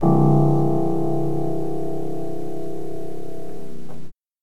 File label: Piano